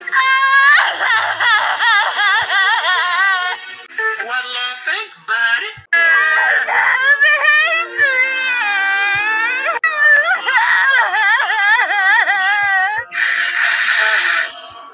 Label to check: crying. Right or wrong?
Right